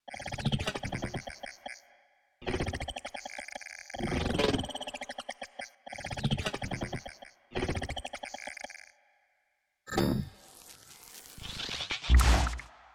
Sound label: Psi Mech Grinder